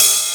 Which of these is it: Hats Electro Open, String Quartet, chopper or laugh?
Hats Electro Open